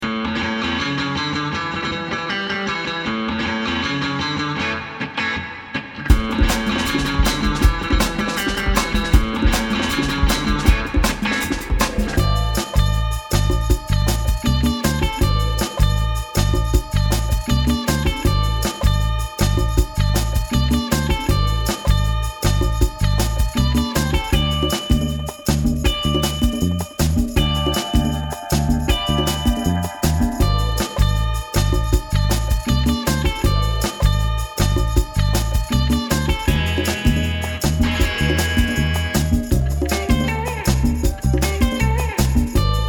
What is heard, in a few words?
Instrumental; PM USA